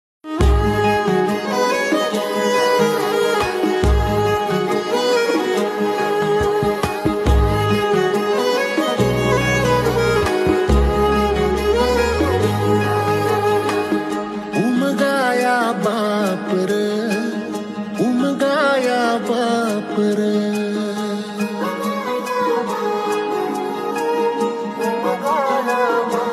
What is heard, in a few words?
marathi ringtone; baplyok